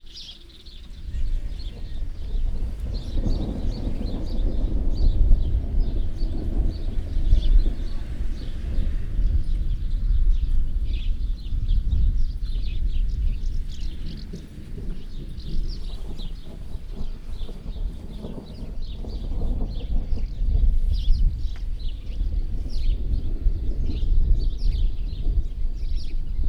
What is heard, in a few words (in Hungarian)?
tavoli dorges, szel, mennydorges